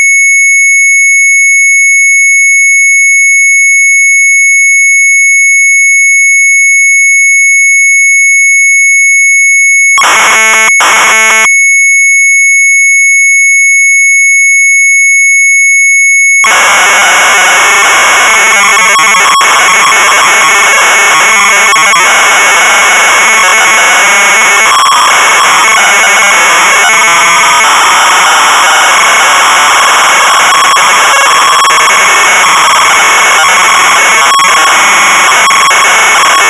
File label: MZ800 Tape, sharp; Revolverhelden1; retromelsarchive